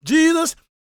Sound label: GOSP MALE, Vocal Planet Gospel